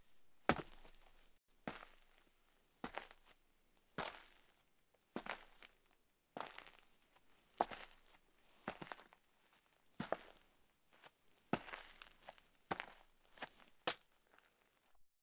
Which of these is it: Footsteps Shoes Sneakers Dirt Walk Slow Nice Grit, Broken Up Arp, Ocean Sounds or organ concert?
Footsteps Shoes Sneakers Dirt Walk Slow Nice Grit